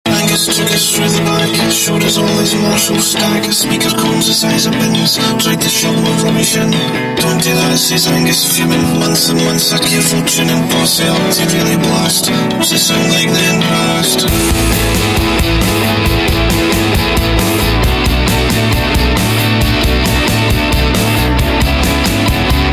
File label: synthesizer pop is here